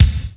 kick, ambient kick; Rhythm Section

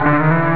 elec gt chrom; jazz jackrabbit 2

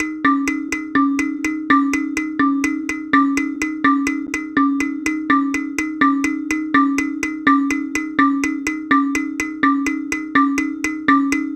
Kalimba; Cinematic Funk; Sonic Foundry (Sony Creative Software); Cinematic Funk Urban Tone Poems

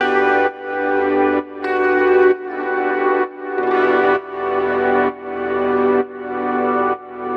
130 E Gtr Wide Chains; Zero-G; Future Wave

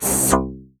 tsk, tubevox dry; Q Up Arts; Denny Jaeger Private Collection

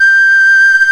BASIC ENSMBL; OMI - Universe of Sounds

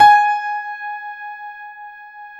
PIA MICRS, MICRO-PIANO; Sound & Vision - Gigapack II

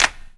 CLAP, Dance Drums

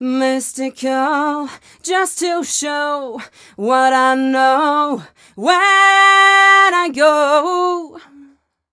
f mel d, fem Vocals

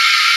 Aud Wall A4; Digital Sound Factory Xtreme Lead

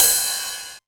909 Ride, Drum Machines; Novation DrumstationV1

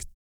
TONGUE HH, Vocal Planet Blues & Soul; ILIO

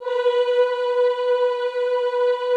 B3, Classical Choir; Best Service; Extended Classical Choir

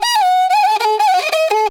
riti grooves; Best Service; World Colours

